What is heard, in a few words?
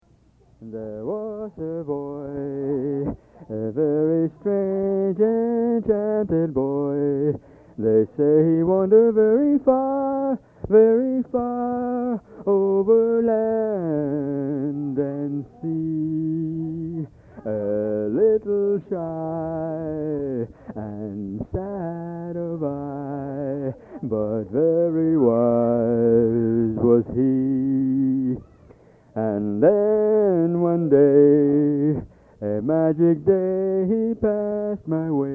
Grandpa singing